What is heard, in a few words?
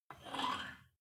weight rack pull out